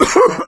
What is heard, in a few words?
cough, player survivor voice; source engine; left 4 dead 2